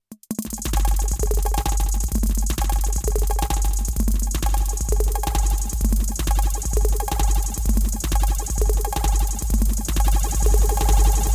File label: Erica Synths Black Hole DSP2 Chirp Delay